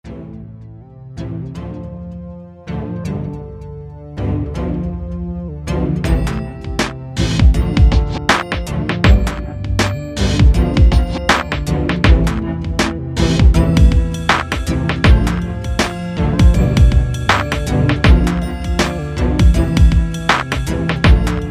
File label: Midi